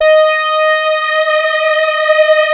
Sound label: FAT LEAD, Powered DX; Wizoo